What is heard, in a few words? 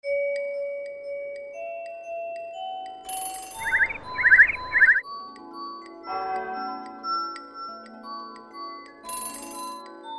dry summer